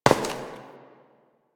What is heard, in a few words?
Step Metal; Warframe; Koumei